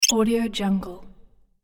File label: bright blip production element